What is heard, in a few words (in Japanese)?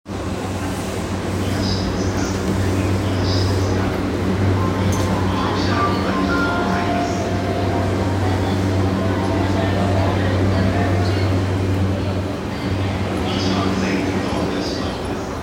横須賀線 東戸塚 北, train sounds